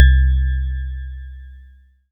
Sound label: C2, SynthLine; NiceBeats